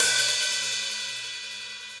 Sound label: Hihat Open Old School; Drum Hits; Standard Hits; Ableton; Factory Packs; Session Drums Studio